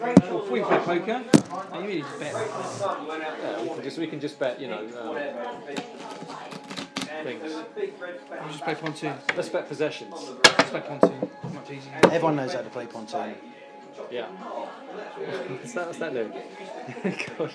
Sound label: pub